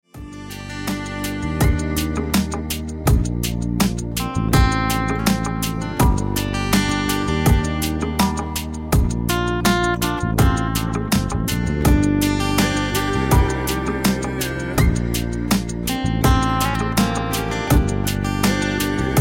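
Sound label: relaxing guitar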